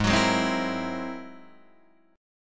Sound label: G sharp Augmented 9th G sharp Guitar Open D